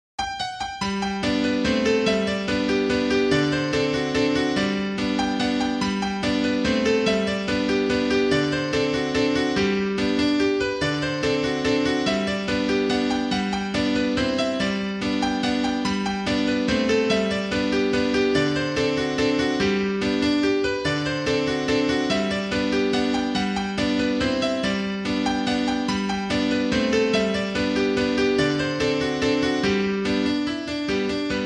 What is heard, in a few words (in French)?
Valse; Mozart